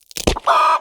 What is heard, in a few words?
Chicken Slaughter